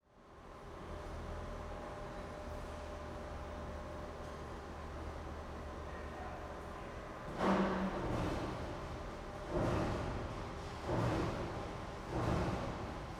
Ambience Factory Interior Machine Starting Up; AdobeCC

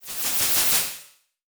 Title Transition Electrical Pulse; Production Element Title Transition

